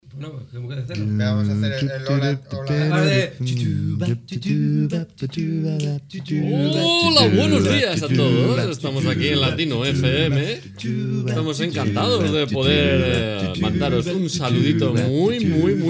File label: tango